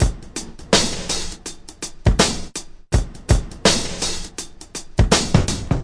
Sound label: 082 bpm noisy snare, drum loops and breaks; bigfish